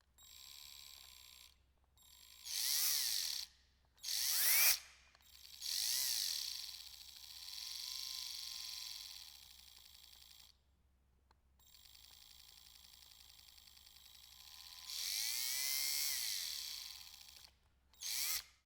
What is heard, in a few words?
household drill